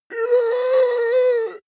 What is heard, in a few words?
monster inhale; kreaturen